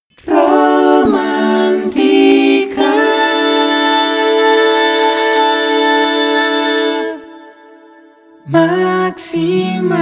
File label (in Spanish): Romantica Maxima Jingle Voces